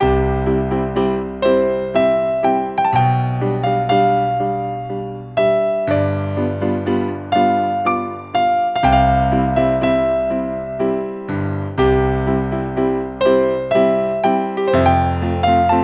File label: Music; CyberMycha 01-2004 (Poland); Klik